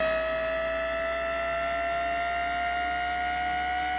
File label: zoom in, phone tones; Samsung; GT-C6620